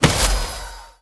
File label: grapple connect; Scrat